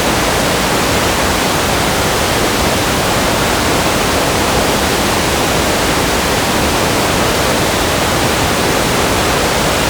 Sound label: Waterfall Loop